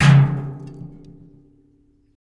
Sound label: hit, rust kit; Nucleus Soundlab; Ambient Drums